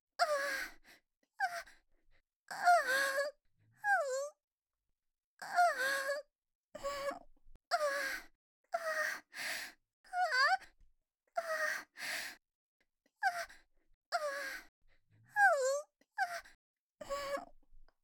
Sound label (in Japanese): 喘ぎ声（中）